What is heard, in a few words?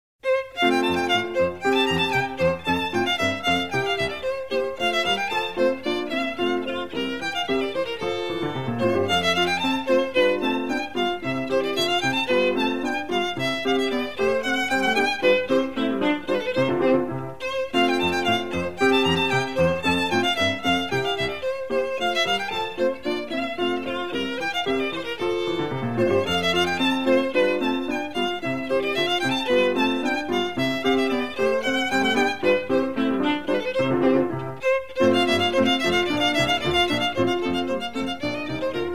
Violin; Suzuki Violin Method; Sonata N 3, 2nd Movement (Allegro), G.F. Handel